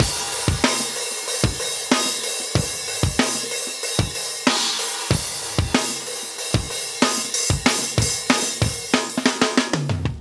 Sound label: 94 No Triangle, breaks, Live Breaks